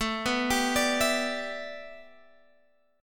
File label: A 7th Suspended 2nd Suspended 4th Guitar Open F; A7sus2sus4 arpeggiated